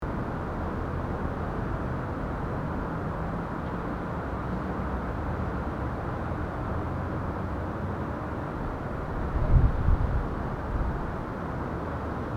Rooftop ambience city distant traffic and wind loop